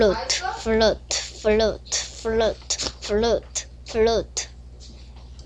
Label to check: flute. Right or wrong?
Right